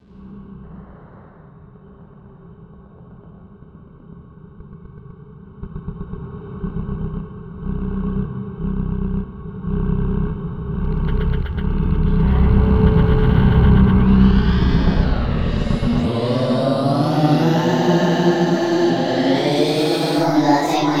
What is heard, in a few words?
Reverb EQ